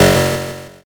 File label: zap